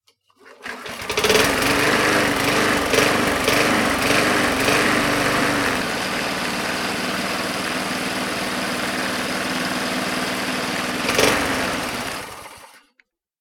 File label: household lawn mower petrol start rev idle off; sound effects one